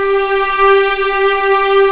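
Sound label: G4 legato piano, violins; East West; The Ultimate String Collection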